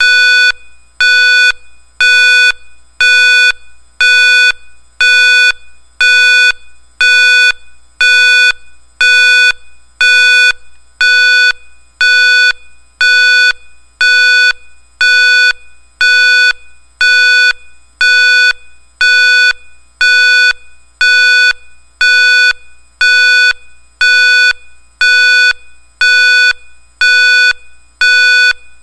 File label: special warning